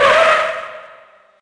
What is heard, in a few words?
Skids Tun Medium